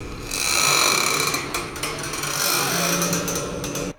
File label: heavy metal cables creaking under tension